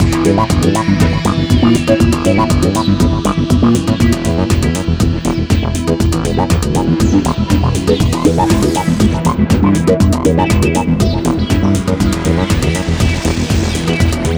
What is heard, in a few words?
Beat2guit; yager windows xbox gamerip 2003